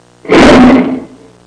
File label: Single lion roar